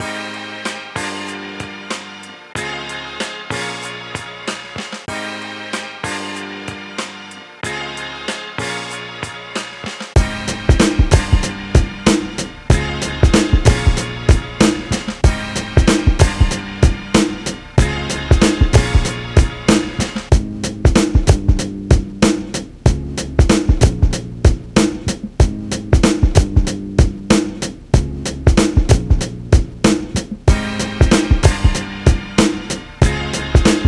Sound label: beats, Maschine